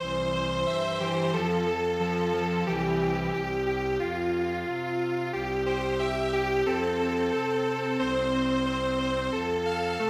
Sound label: Strings Quartet